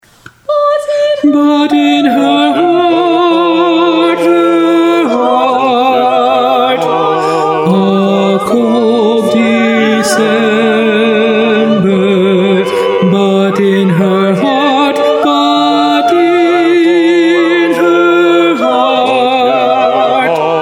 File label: tenor predominant